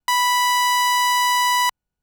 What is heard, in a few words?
Ramp B5; fleet keys Samples